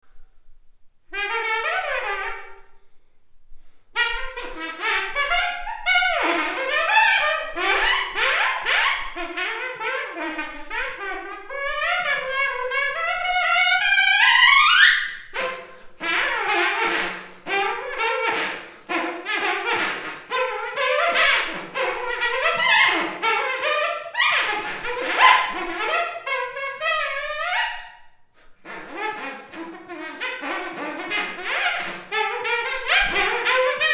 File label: TRUMPET TOOTER IMPROV